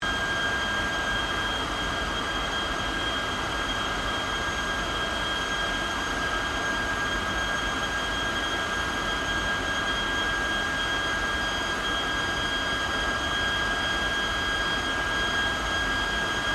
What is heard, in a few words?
AIRCRAFT GLOSTER METEOR F MK8 IDLE, Gloster Meteor fighter jet; Airplanes; Vehicles